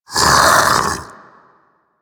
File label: Infested Splitter Split Vocal Attack Medium; Warframe; Grey Strain